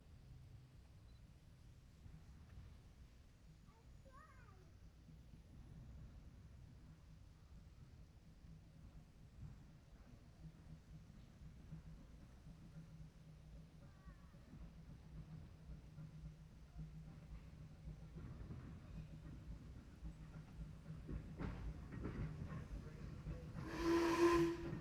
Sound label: Vehicles Steam Train Passing By Stereo Binaural